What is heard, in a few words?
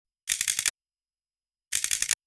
6 identical sharp dslr ca, photography